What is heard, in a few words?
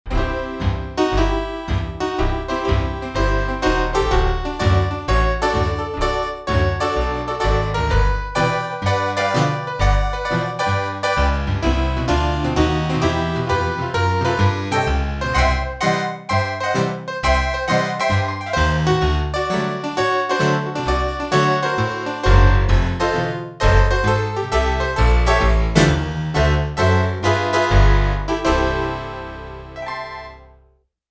Country Bar, Roland JV-880; Factory Patches